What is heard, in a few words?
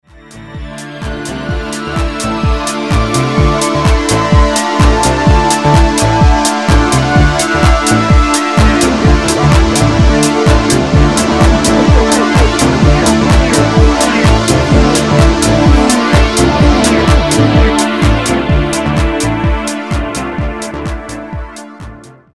electric wave